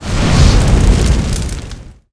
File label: Fire hit